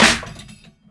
Snare; Ableton Live 12 Lite Core Library

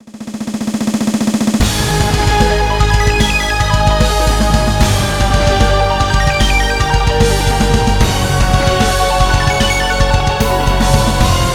bgm power up max; ZeroRanger

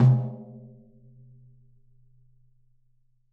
Tom Yesteryear; Drums

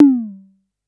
Tom High, Drums; One Shots

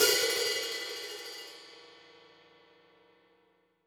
Hihat Open

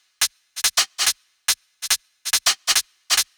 Hihat Off UK 142 bpm; Loops; Drums; Top; Doka; Ableton Live 12 Lite; Core Library

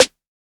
Snare Crispi

